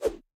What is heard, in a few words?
Swing Large